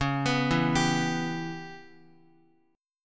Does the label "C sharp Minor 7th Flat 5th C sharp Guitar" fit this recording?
Yes